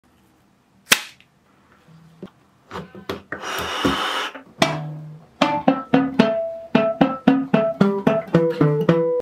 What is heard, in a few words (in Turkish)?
gitari banjo